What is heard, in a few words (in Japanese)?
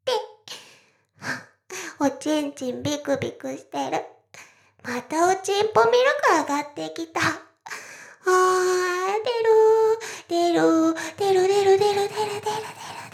しこしこ; 全妹計画２; 【メスガキ妹】わからせてくれるのよねぇ～?おにぃ～・ちゃん♪【全妹計画<オール・シスターズ> 2妹め】